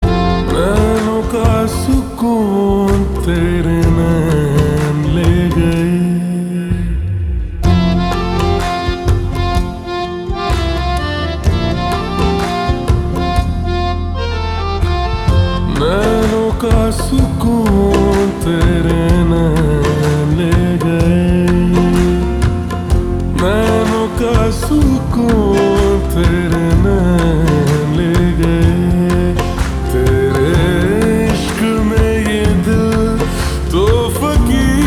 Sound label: Ringtone Slowed Reverb